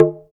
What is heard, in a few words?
TALK DRM, Heart of Africa; Spectrasonics